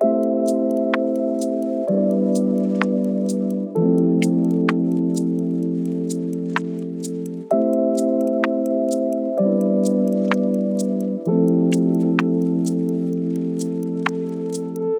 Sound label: ambient hive loop